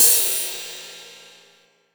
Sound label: Rides, Cymbals